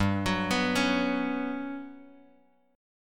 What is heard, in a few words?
G Major Sharp 11th G, Bass Standard; arpeggiated; GM#11